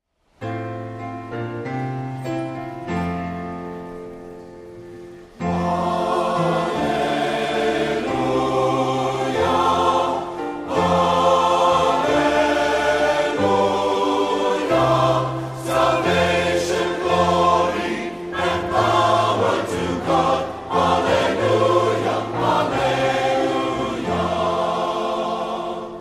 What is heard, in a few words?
Gospel Alleluia; Easter Sunday; St Mark Sunday Mass